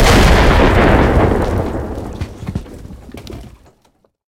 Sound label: building hit, tanks